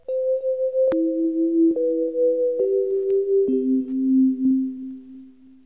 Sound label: Shutdown; Windows Never Released Soundpack 1